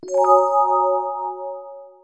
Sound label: lottery open